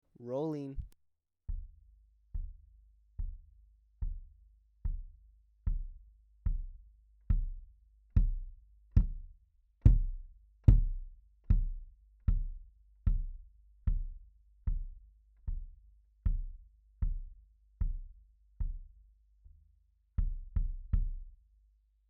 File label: Kick in from outer head